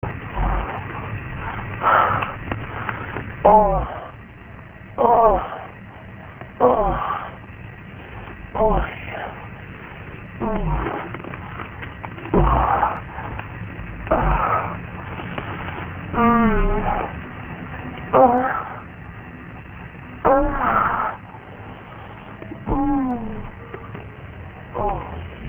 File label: sexbreath; Sonic Foundry (Sony Creative Software); Ugly Remnants VOL-2; UR 2 FX (Long)